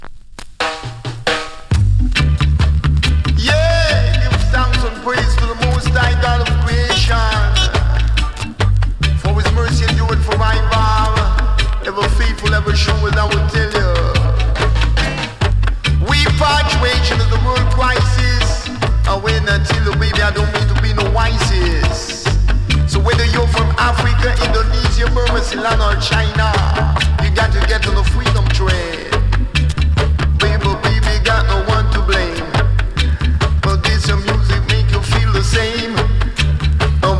MUSICAL DRUM SONG

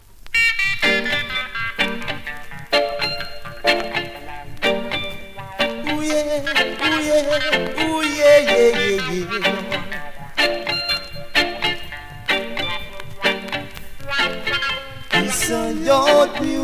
yard music

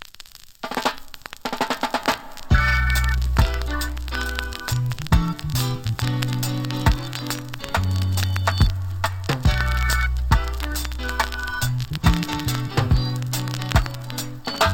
roots rock reggae

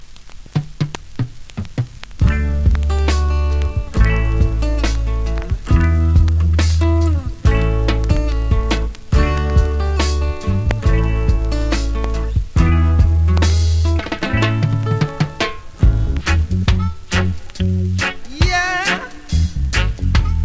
taking blues

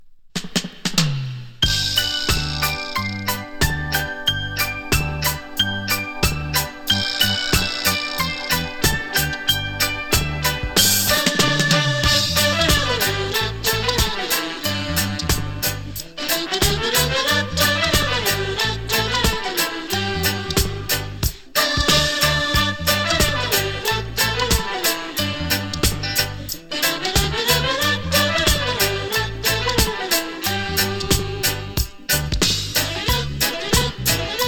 sleigh bells chant

